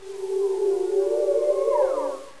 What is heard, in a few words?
Ghost